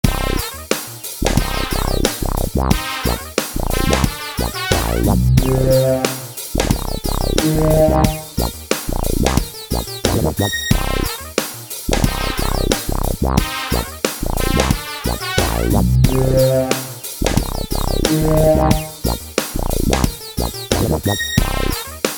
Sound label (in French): la trompette